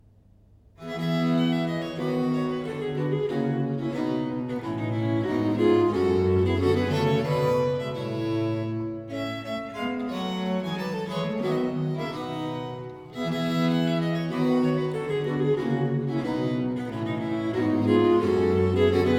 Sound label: suite no. 4 in four parts, in f major iv. sar, english music for viols